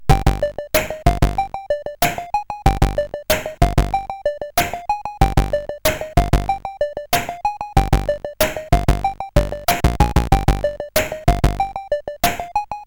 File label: Teleporter, sid CSG8580R5